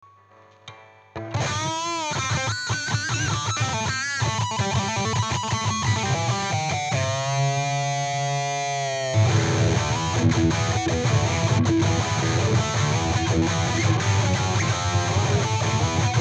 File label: Heavy Metal